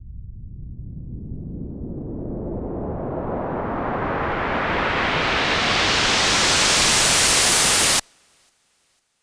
Uplifter; 99Sounds x Monosounds

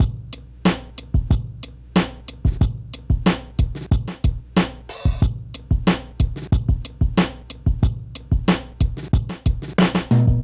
Drum Loops Minimal; Zero-G Smoke

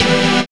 SYN, DANCE SYNTH

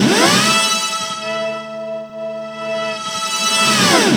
launch pad; Spectrasonic Distorted Reality 1

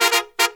Pop & Funk Brass

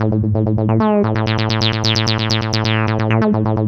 A2, synth line; 90 s Sample CDs; NiceBeats; SynthLine VOL-3

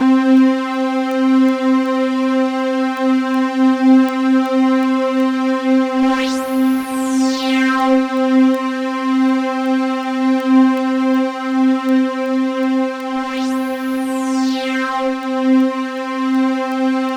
C3 FM, Synth; 90 s Sample CDs; NiceBeats; SynthLine VOL-20

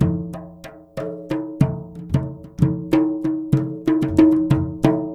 MLY DRMS; Spectrasonics - Heart of Asia CD2

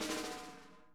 SNARE, Interactive Drum Kits

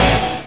HITT

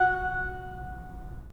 Pipe Mix Celeste, 3D Organs; E-MU Producer Series Vol. 5 – 3-D Audio Collection

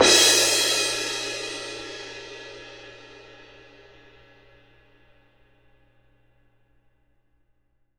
CYM F, Percussion; ILIO; Synclavier Percussion 2; World & Orchestral

